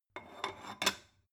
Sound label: stone bowl shelve soft